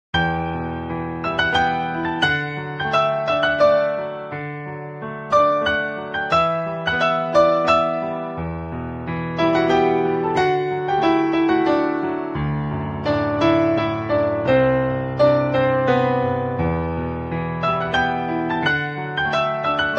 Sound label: Piano